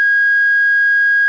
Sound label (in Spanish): tono; sirena multitonal 8 sonidos o 70mm 24v cca; Módulo de sonido Ajustables